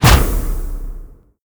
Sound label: excitement spin start; netent